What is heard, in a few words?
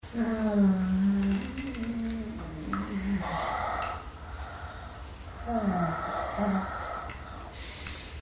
suck; Low Blow